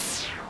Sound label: gas sound